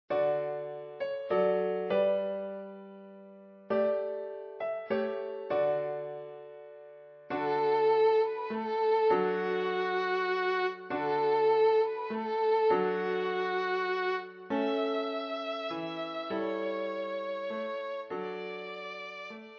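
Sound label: violin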